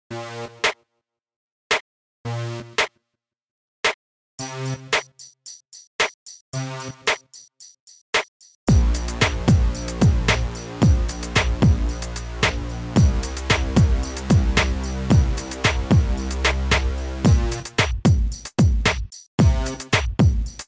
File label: SHAPES instrumental